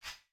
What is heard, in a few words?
whoosh Forth Close; HeavenStudioPlus; Air Rally